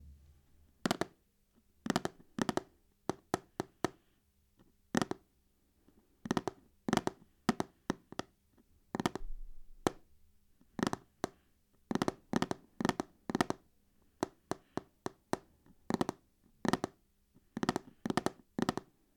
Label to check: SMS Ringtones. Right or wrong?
Wrong